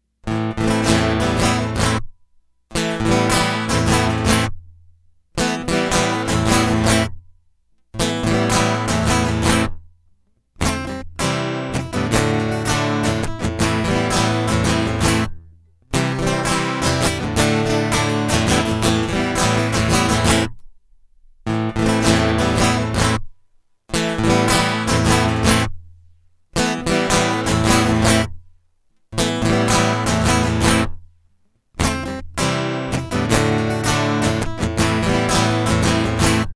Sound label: To the Top Guitar